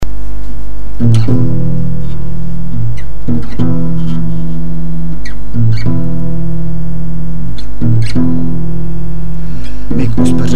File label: chat blues